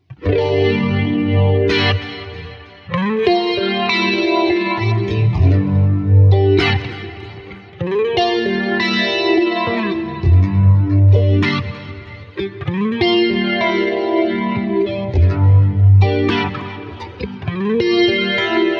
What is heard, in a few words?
Reverb Complex Setting; Prussian Blue